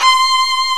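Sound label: ARCO violin, Solo String; E-MU Producer Series Vol. 2; More Studio Essentials; Composer; Solo String Combo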